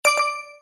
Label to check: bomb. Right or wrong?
Right